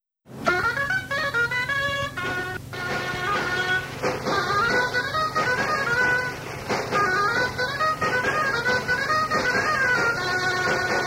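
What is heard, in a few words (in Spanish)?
Danza de procesion, Bailes tradicionales en Valladolid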